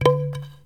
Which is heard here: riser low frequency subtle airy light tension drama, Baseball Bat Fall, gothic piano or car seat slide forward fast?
Baseball Bat Fall